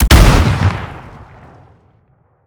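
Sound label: concussion explosion body; gmod; viper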